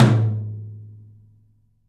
open tom; 3D Sound; 3D Drums; 12 TOM

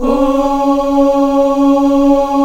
AFRO OO C#4, CHOIR; Spectrasonics; Heart of Africa 1

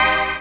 HOUSE, House Dance

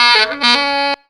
5 NOTE, FUNKY SAX; Zero-G; Funky Elements

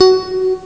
syntetic; cardo; ST-XX Amiga Soundtracker Sample Packs

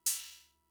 Cymbal; Fricke MFB-522

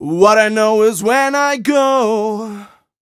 m 127 mel a, Vocals

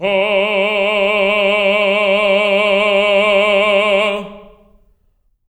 TENOR F G#3; Spectrasonics; Symphony of Voices

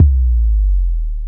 808 DROPDOWN, 12-Bit Drums; E-MU Formula 4000 Series Vol. 1; Hip Hop Nation

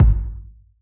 pop kit kick

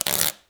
Wicker Basket, household rhythm samples hits

